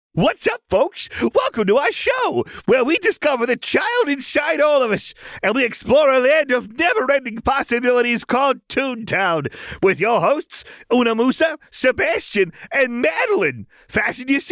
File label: heeheehoohoo